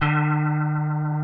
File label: GUITAR FX